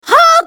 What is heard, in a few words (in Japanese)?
攻撃; ミフユ